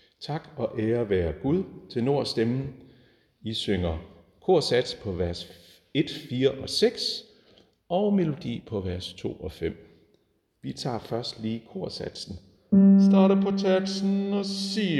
tenor, koret

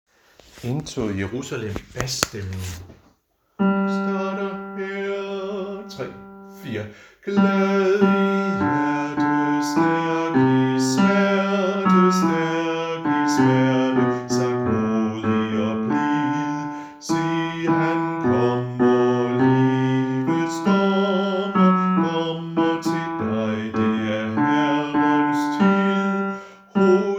bas, harlev koret

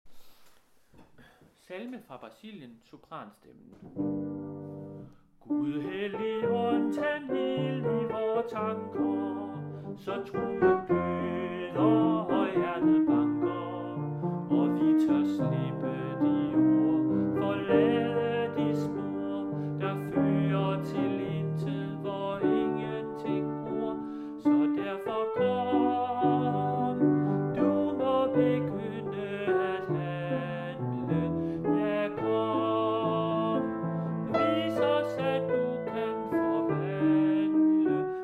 sopran; Salme fra Brasilien

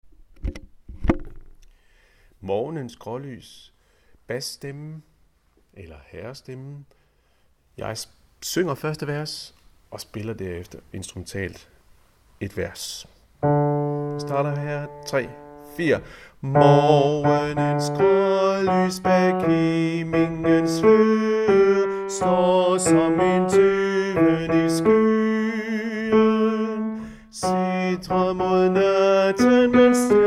Morgenens grålys B; harlev koret

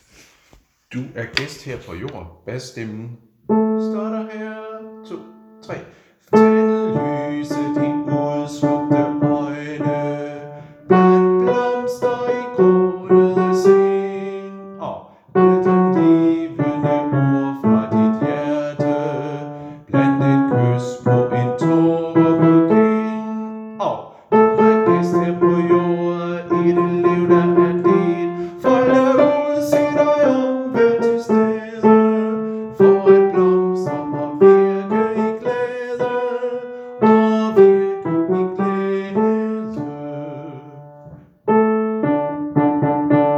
BAS

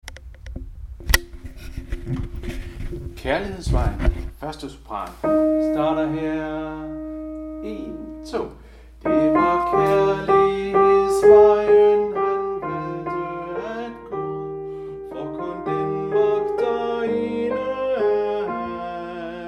1. sopran; Kærlighedsvejen

